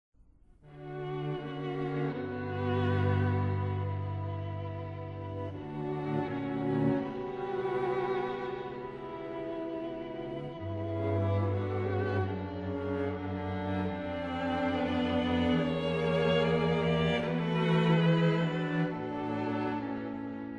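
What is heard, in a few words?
string quartet; bax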